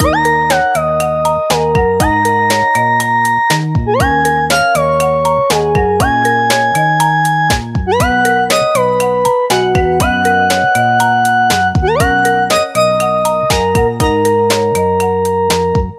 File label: Rhythm, ringtones